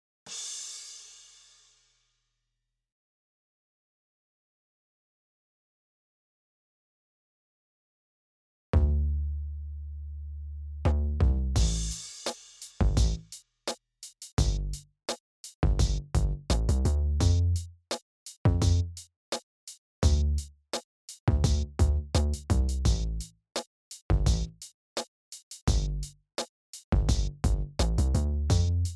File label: rhytm bass uneqed; eiosis aireq premium